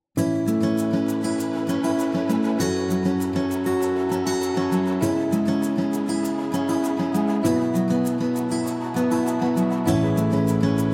Motivational Indie, corporate music; corporate music vol 19

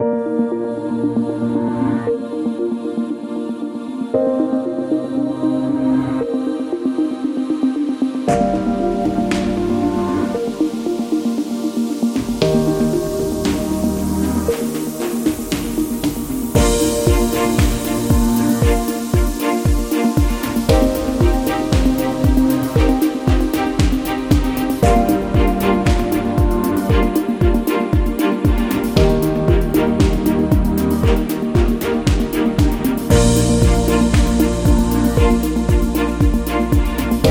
Corporate Electronic; healthcare

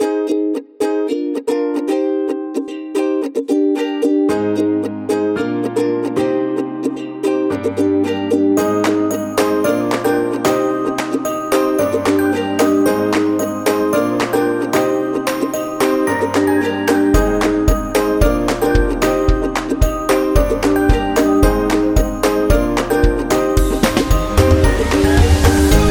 Happy Birthday Upbeat; birthday music

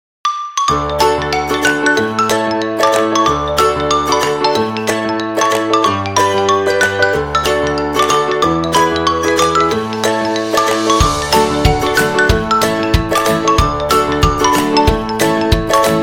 Fairytale; sounds of the world

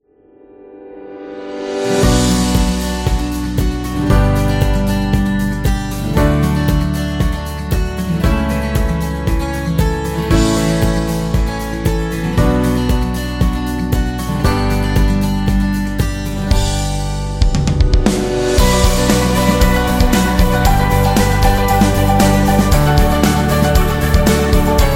Inspiring Acoustic Guitar; happy music vol 9

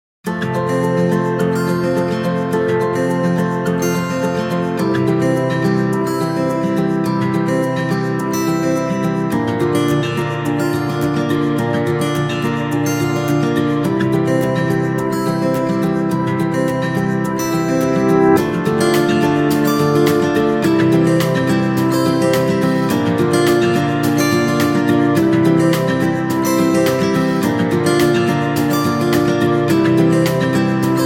Acoustic Guitar Upbeat Background Music; happy music vol 7

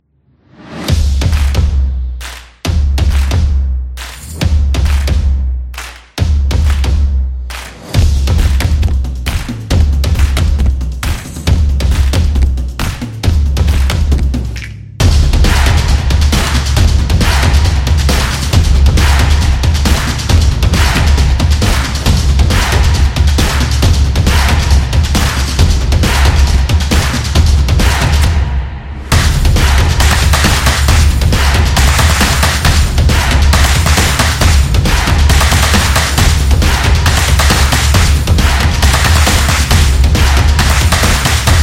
Stomp Clap Slap

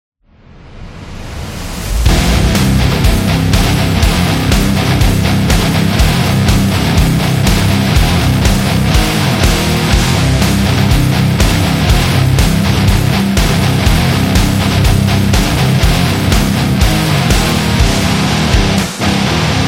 Powerful Rock

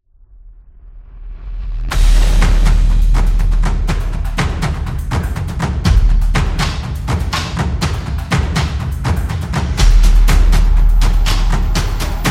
Hybrid Cinematic Drums; tribe

